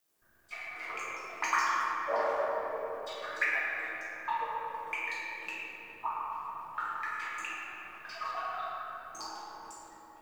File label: Dungeon Island Cave Drips, Ambience; Warframe; Duviri